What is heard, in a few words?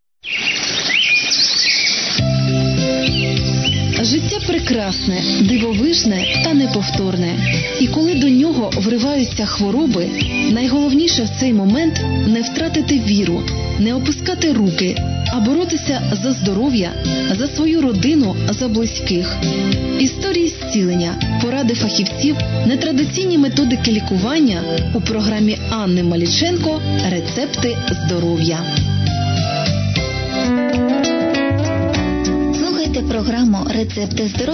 interval signal; ur mykolaiv